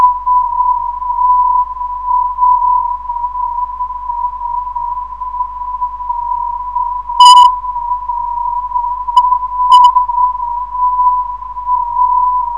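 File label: STA 414.5kHz, non-directional beacon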